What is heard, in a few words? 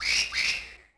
bird Call; theed; Star Wars Obi-Wan; XBOX